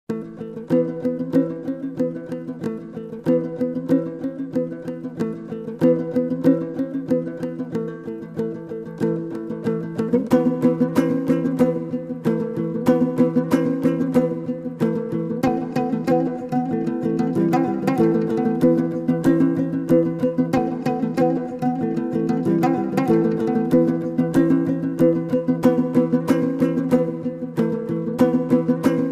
Mongol Steppe

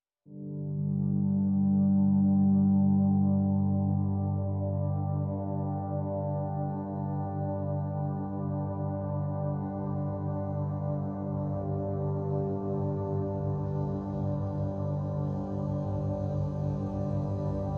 Pads (G)

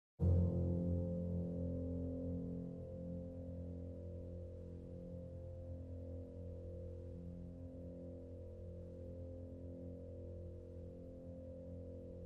Underscore Only Piano

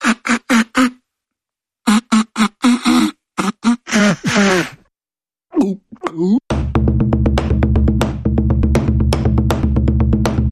Blow Hard Percussion Bumper

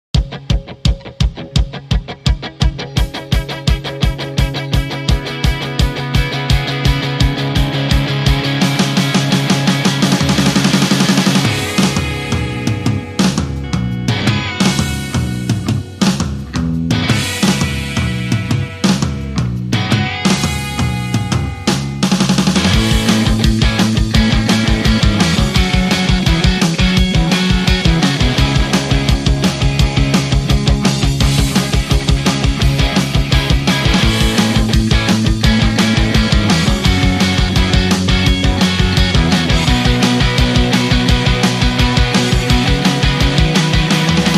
No Main Lead Guitar Mix

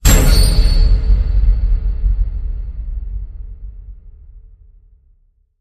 Afterlife Sharp Flash Hit

